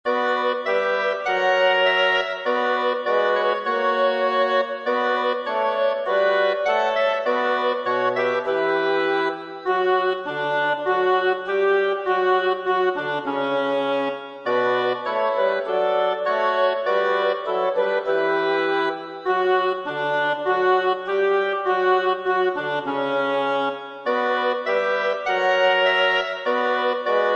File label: Sakura SATB